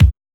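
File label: BD, bass drum; X-Static Goldmine 1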